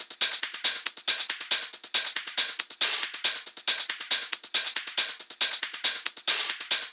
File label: NuTrance Kit 1, 138.5 BPM; Vengeance Samples